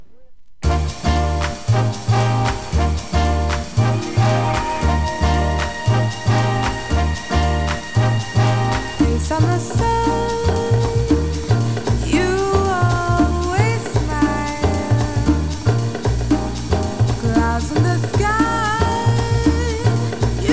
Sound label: waltz; germany